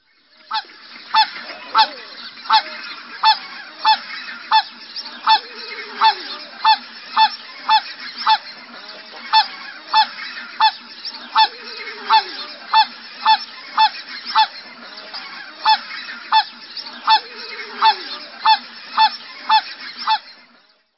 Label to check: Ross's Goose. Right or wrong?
Right